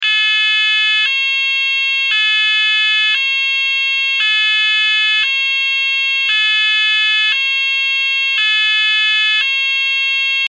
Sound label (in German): Ton